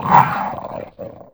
cerberus atk; GammaAge

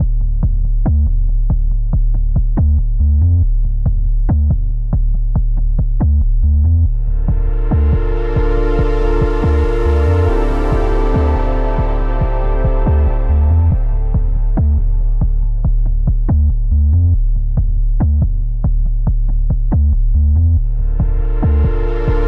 Ambient Working Intensity 1; NWNDS; Ambient music pack

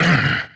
hit; NWNDS; Vhailor

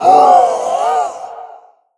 hit; GammaAge; creature

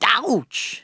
hit; NWNDS

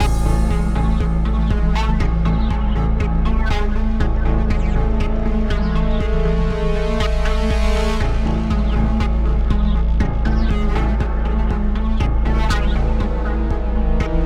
Ambient Fight, Music; NWNDS; Ambient music pack

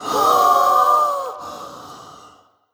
bat; creature; GammaAge

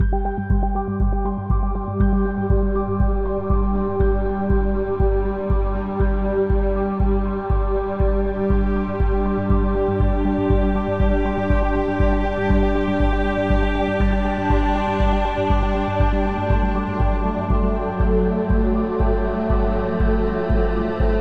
Ambient Exploration; NWNDS; Ambient music pack

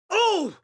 hit; NWNDS

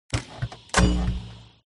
reload rocket

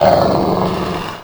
cerberus bat; creature; GammaAge

